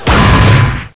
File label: metal